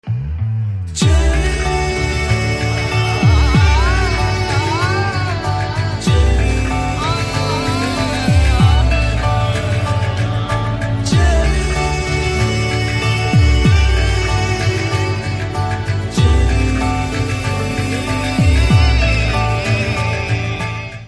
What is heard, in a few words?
BGM Love Ringtone; Naandhi